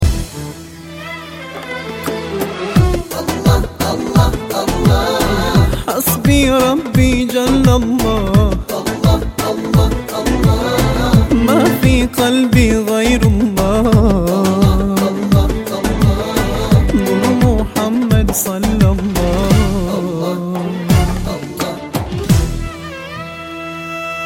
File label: islamic Ringtone